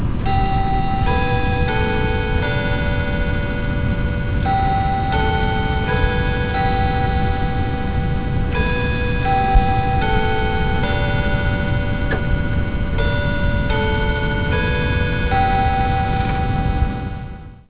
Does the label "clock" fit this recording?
Yes